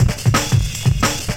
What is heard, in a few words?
175, Breaks; EZRollers